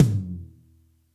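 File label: TOM soft; Zero-G; Chemical Beats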